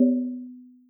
Windows 3.1 Prototype Shutdown; Windows Never Released Soundpack 2

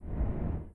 Windows NT Startup; Windows Never Released Soundpack 2

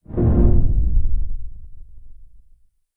Windows Vista Alpha Shutdown; Windows Never Released Soundpack 2

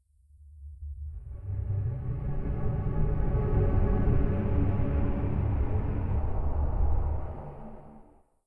Windows NT Shutdown; Windows Never Released Soundpack 2

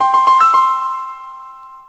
Startup Sound, Mobile Sounds

